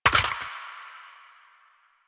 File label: Pistol Reload Mag Drop; Weapons; DE; Warframe; Tenno; Lasrian